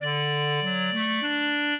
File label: minuet, clarinet; Mozart